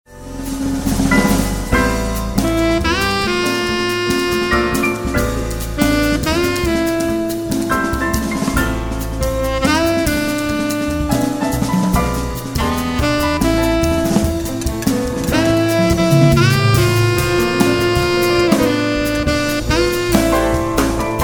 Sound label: melody